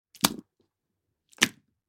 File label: Sloppy food squish baked beans; cooking